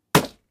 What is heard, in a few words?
Wood Impact